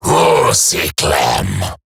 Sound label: Surprised Male; en; Dialog; Barks; Battle; Grineer; Eximus